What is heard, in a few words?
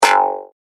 Twang Sound Deep; Twang Sound Effects; Cartoon / Game